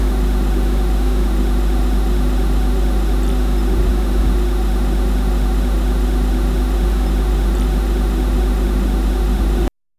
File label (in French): doux vrombissement d'une v, electric